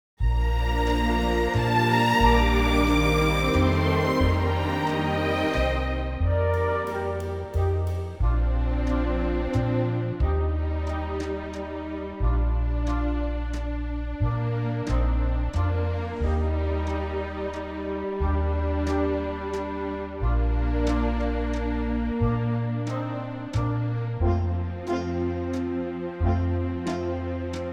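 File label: Bb 3/4 orchestra; key - Bb - vocal range - Bb to D; Lovely orchestral arrangement, in 3/4 modern waltz tempo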